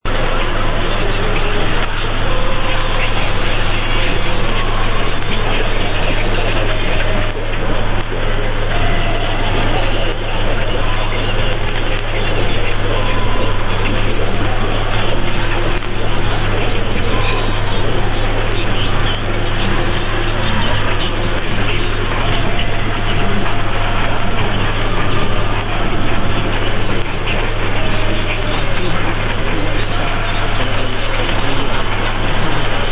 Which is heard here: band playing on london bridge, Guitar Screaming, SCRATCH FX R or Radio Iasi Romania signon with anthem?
Radio Iasi Romania signon with anthem